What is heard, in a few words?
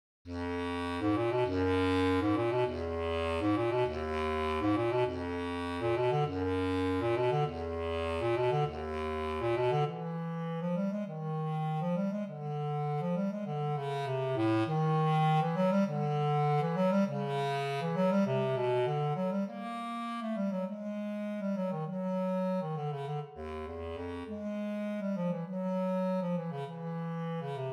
Bass Clarinet